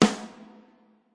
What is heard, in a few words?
Rock Snare; REAL LIVE KITS; Osama Qureshi DrumKit